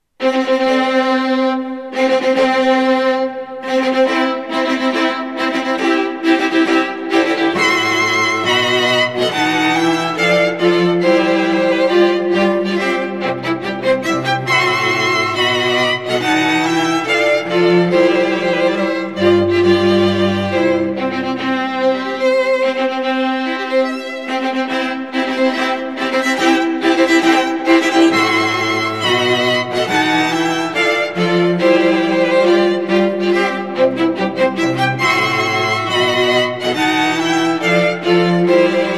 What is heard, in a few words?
Wedding March, string quartet; Mendelssohn